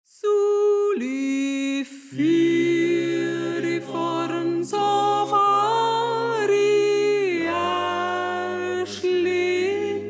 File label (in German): Sopran